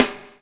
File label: BUBL, BUBBLE; Spectrasonics - Backbeat